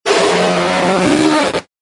elephant head cold

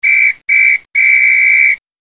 Referee Whistle

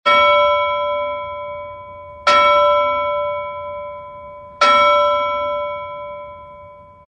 bell buoy ring

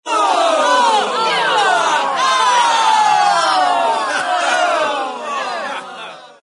crowd voices disgusted reaction

